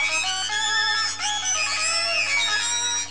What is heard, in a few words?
pitch, guitar; amiga